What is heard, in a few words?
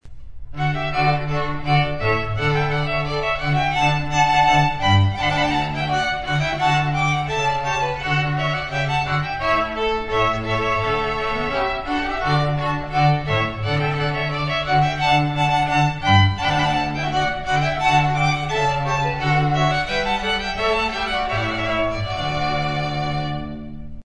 Rondeau, strings